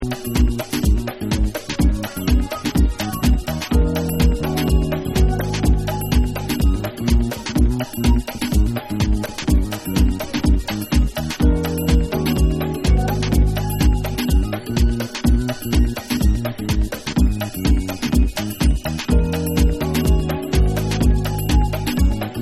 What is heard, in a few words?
Afro Vibes Dub